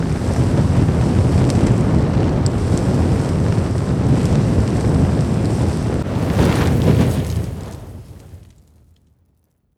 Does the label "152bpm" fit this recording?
No